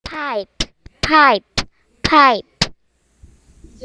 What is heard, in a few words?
pipe